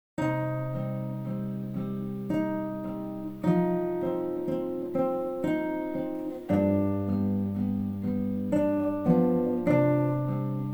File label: Sad song. Etude, guitar; No.14